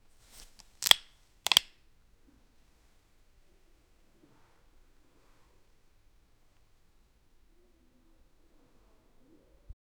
buka soda, beverage

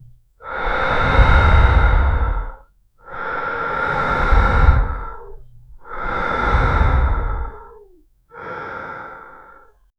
suara nafas orang lelah, breathing

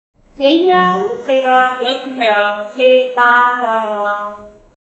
kesibukan di sebuah dapur, boiling

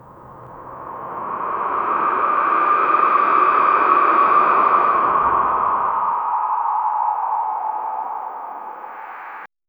langkah menjauh angin kem, mystical

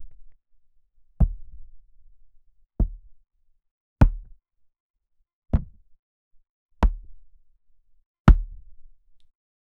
suara bola ditendang; soccer

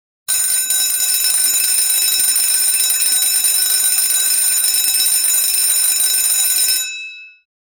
membuat suara bel sekolah; school

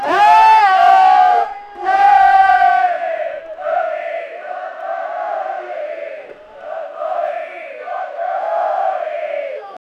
suara masyarakat indonesi, protest

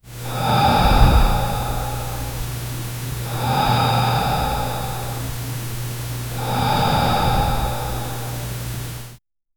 suara nafas dari laki laki; asleep

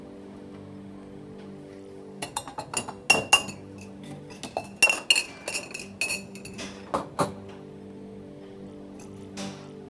suara kafe yang ramai

